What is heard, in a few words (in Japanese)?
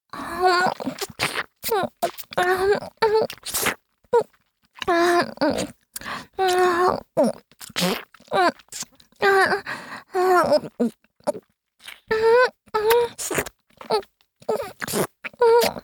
キス(強):舌を絡めたり歯や歯茎を舐めあうディープキス